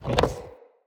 Fire Rain Ground Rupture; Powers; Fenn

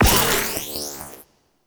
Corruption Pickup Hostile Small; Warframe; Zariman; Missions

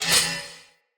Curved Sword Whoosh Accent; Weapons; Player; Swords; Sinecure

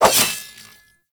Nikana Swing; Weapons; Warframe; Tenno; Revenant

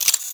Moa Ability Fail; Warframe; The New War; Characters; Veso

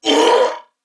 Pain Corpus Venus Male, en; Dialog; Barks; Battle; Warframe; CorpusVenusMaleB

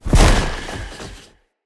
flock projectile impact; Enemy; Abilities; Sinecure; Ranged Hunter Thawtide

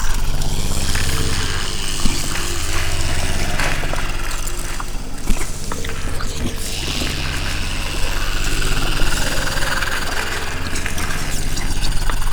Corruption Fracture Loop; Warframe; Zariman; Missions

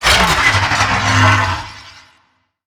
Necramech Melee Death; Battle Barks

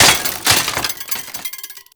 Bicycle Hit; Warframe; Ambience; NineteenNinetyNine

